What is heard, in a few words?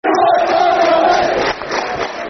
Green And White Barmy Army, Football Chants (Soccer Songs); Plymouth Argyle Pafc